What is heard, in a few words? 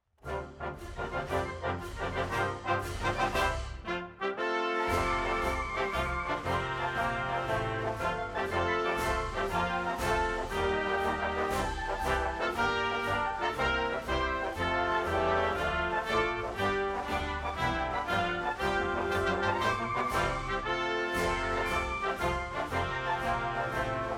Instrumental; Space Force Song